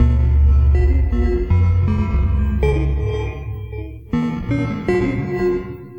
Melody, IDM

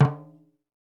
NIGERIAN, African Perc; E-MU Formula 4000 Series Vol. 4; Earth Tones

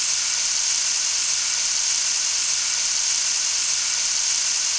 Rain Stick Constant; Sonic Foundry (Sony Creative Software); Joe Vitale Latin Percussion

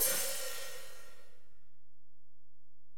HiHat, Alesis DM-PRO

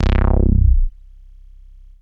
Bass Synths hits and octaves

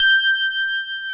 PIA DX E-PIANOS; Sound & Vision; Gigapack I CD 2; PIA DX-Pianos

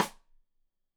Side Stick Stereo; Deeply Sampled Drums

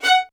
LDVLN1 ATT F, LOUD VIOLINS; Q Up Arts; Denny Jaeger Master Violin Library

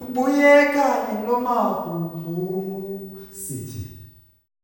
una solo, male; Spectrasonics; Heart of Africa 2; S.MALEAMB

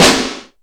All Snares, Drum Machines

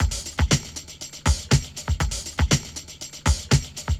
Tambourine Loop; Sonic Foundry (Sony Creative Software) - Electronic Point Blank

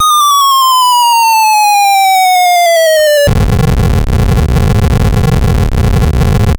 Bomb; Graz

